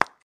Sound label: plop